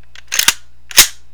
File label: rifle cock